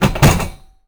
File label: Metal Cart Rattle Hit; Foley - Rattles